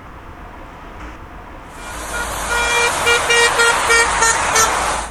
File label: Semaforo Car